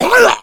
hunter pain short

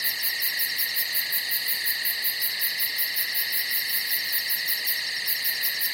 cricket loop; Left 4 Dead 2

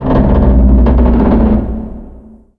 fire groan, ambient

